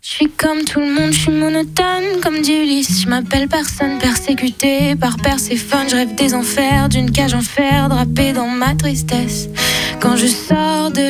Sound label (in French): Trop Acoustique